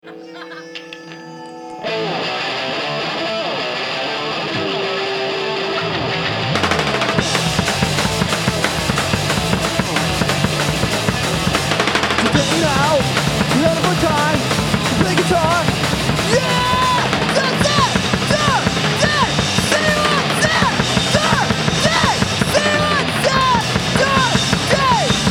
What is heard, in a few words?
Punk Rock